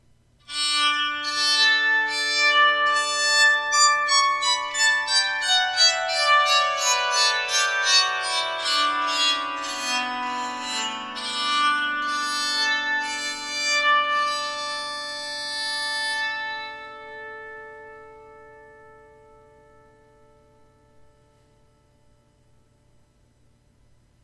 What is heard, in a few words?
scale, bowed psaltery